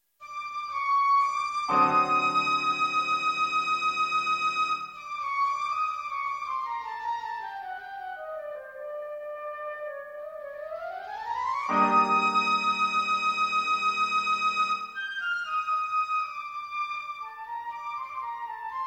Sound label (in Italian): flauto e pianoforte; Per flauto e pianoforte; Simbarrangements